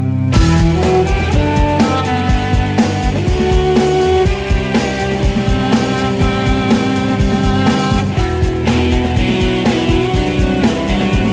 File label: ringtone; angel series